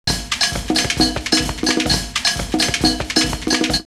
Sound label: Kampala 130, drumloops World Ethnic; X-Static Goldmine